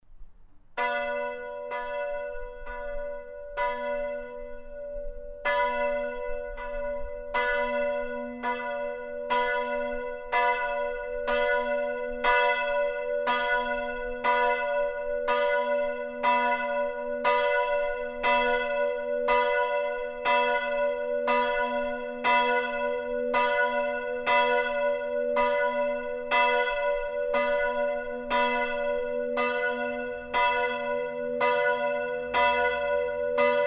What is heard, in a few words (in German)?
Glocke H; St. Nikolaus